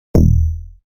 Bass Shot; Unit Rcrds

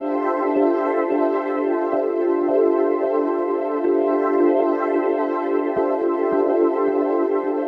Synth Loop 125 bpm Dmin; Unit Rcrds

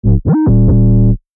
808 Slide

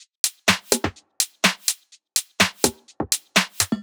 Drum Loop 125BPM; Unit Rcrds

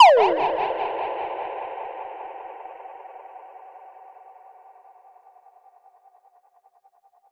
Fx Shot 125BPM; Unit Rcrds

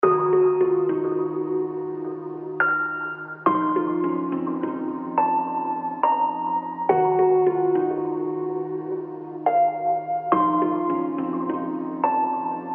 Flex Melody Loop

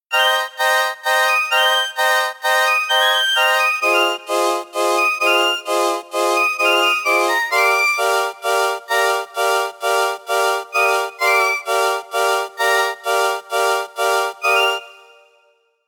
KEYS

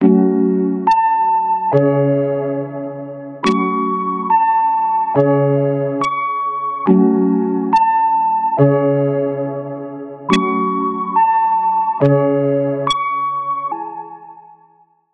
Drill

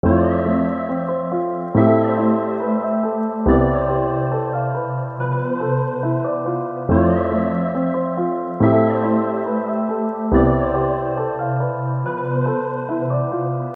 Melody Loop

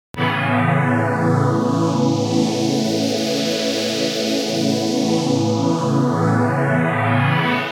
Pad; Unit Rcrds

